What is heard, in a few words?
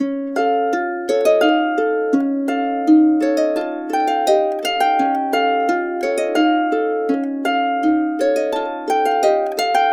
DB 84, Complete Harps; USB Soundscan vol.62; AKAI